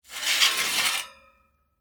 Vent Cover Remove, Industry, Vent, Cover, Removed, Metal, Slide; Machinery; BlastWave